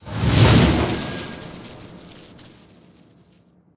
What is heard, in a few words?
Crystal Bolt Loop start; Frog Infuser